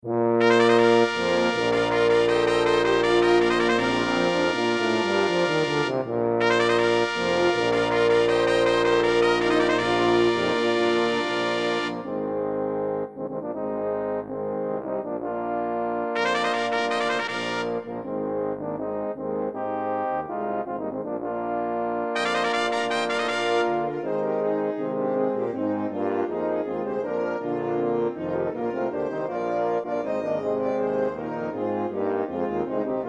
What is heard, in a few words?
Fanfare for Brass